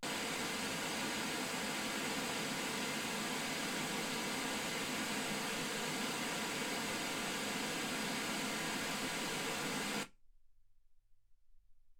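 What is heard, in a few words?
white gaussian noise 48kHz; single mic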